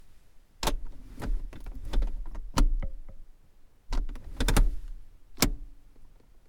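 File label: Elantra gear shift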